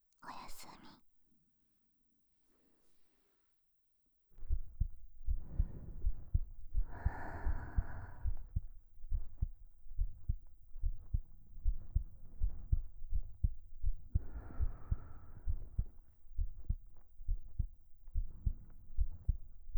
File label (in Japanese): 添い寝ハートビート; 44 1kHz16bit